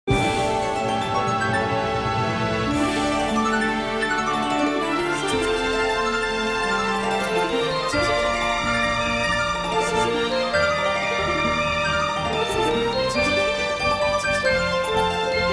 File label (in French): à la lyre; Skyward Sword